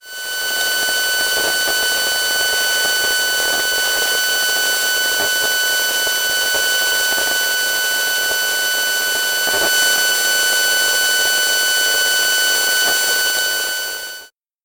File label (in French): Antenne relais telephonie mobile echantillon; Antenne relais téléphonie mobile - échantillon 4; Ondes électromagnétiques et électromagnétisme